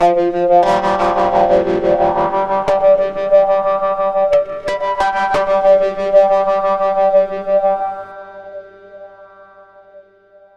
FX DIST; FX DISTO

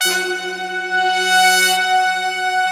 big brass, Slow Big Brass; Optical Media International - Sonic Images Library

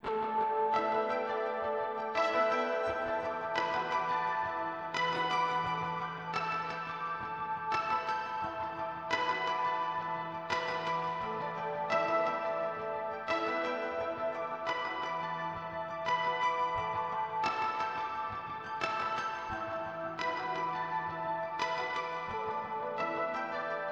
86BPM E

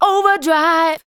vox, Techno Trance; Ueberschall

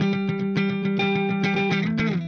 Clean Funk Guitar

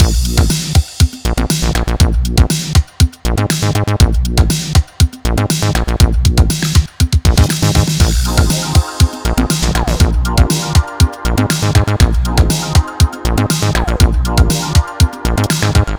full, 120 bpm, nu dance electro; Zero-G; Soundclash; Acidized Wavs; Construction Kits; Block Party

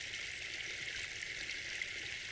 Waterway E Dark

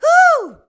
HUH, SHOUTS; Best Service; Hyper Dance

